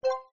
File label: Button Click; word wipe